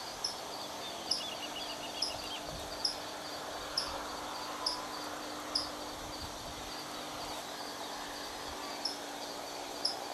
Hydropsalis; Chajari; Inm Perc y 25 de mayo

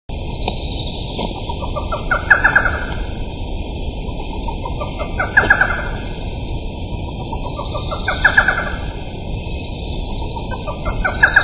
Micrastur ruficollis; PN Calilegua

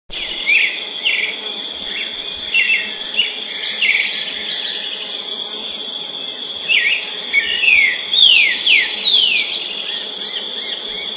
Turdus amaurochalinus; PN Chaco

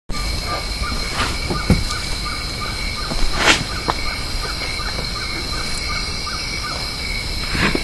Glaucidium brasilianum; Ruta 101; Jorge La Grotteria

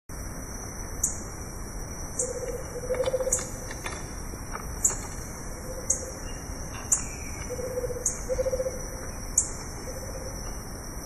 Baryphthengus ruficapillus; Ruta 101; Jorge La Grotteria

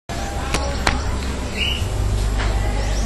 Myiarchus ferox; Itaparica; Jorge La Grotteria

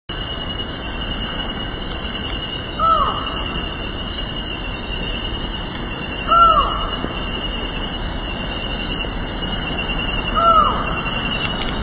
Micrastur semitorquatus; Ruta 101

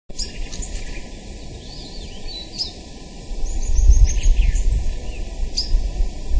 Rhynchospiza strigiceps; PP Chancani

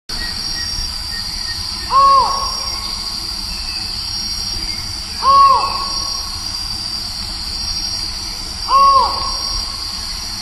Micrastur semitorquatus; PP Cruce Caballero; Jorge La Grotteria

